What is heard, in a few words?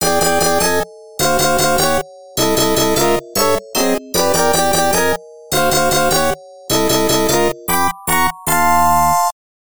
Landing Failed; Midnight Landing; arcade